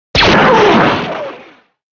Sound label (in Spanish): comprar item; dg deathrun-2021